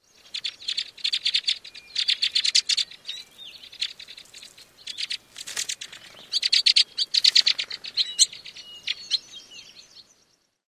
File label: calls